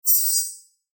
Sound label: Mouseover Metallic Sci-Fi